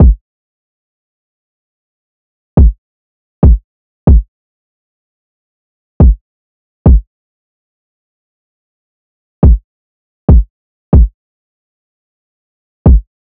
Drum Loop 140 BPM Kick; Loop Stems; Cymatics; Mystery Pack Vol 4; Birmingham

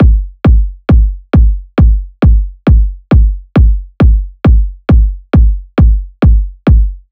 Kick Loop 135, Techno Drums

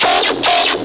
Bass Hit D#, Dubstep; Radiance Bass; Ghosthack; AC2024 - Day 13 - Dubstep Sounds 2025

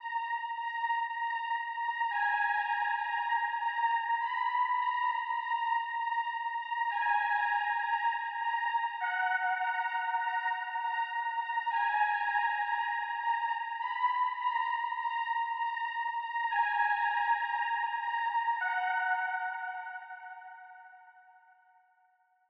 100 BPM D# Min Pluck, Pop; Cymatics; GHOST (Beta Pack); Broken Heart

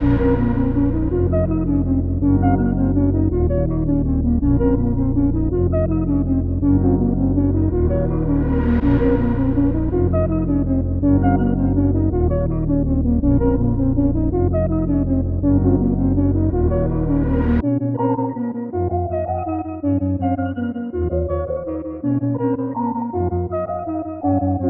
109 BPM A# Min, Melody; Cymatics; 2022 Melody Collection Platinum Expansion

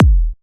Kick Tr4nce; Drums & Percs

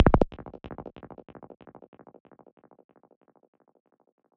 Squelch G# 140 BPM, Psytrance; Ghosthack; Psytrance Visions Volume 2

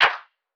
Clap, Drum One Shots; Claps; Cymatics; Eternity Sample Pack

